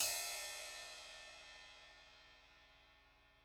Crash A Medium 17 Tip Low Vol

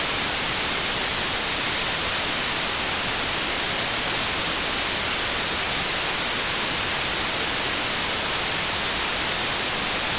White noise

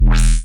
Wub

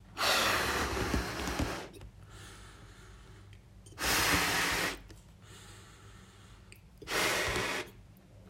Blowing Up A Balloon

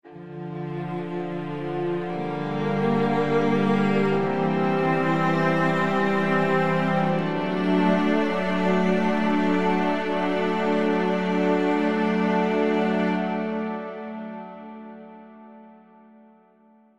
Solemn Intro

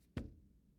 Light Thud

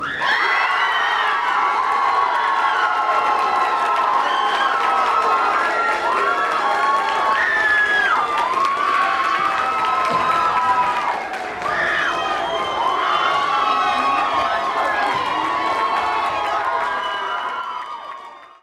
Students Cheering

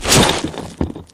Destroy

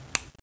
Light Switch Turn On Sound

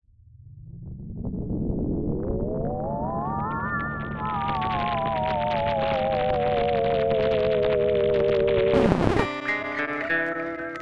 Vinyl guitar

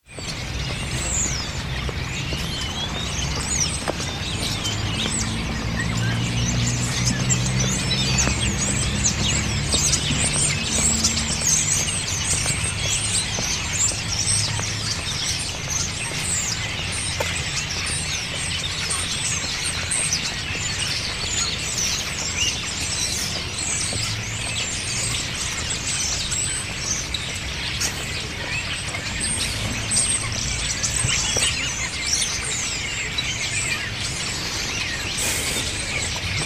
Bird S Gathering

